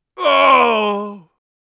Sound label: death, Mage Voice Pack